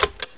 SW PUSH; RE-Sounds

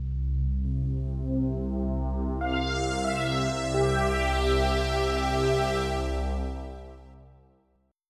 synth

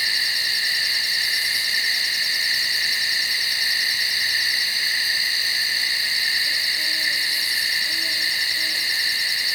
Birds Loop; kult

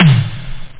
drum

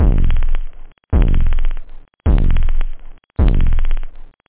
acid, drums technobd; da capo vol1

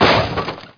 droid hit; DroidWorks demo

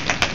Kruckcht; Burning Rubber 1.2.3

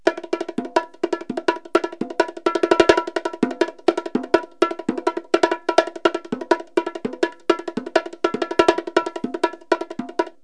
Latin Rhythm; KABOOM! - Wild and Wacky Sound Software; Music; Ethnic & Ceremonial

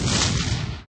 WARPED, EXPLOSIO; PaxImp

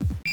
Menu Popup

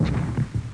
Exp Small; America Invades! Demo

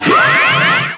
zap

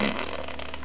WET; palace

